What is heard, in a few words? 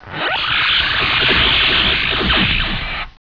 MAGIC